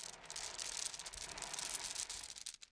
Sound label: game start tiles; RS Games Sounds; Scrabble